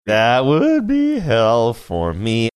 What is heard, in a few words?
singing that would be hell for me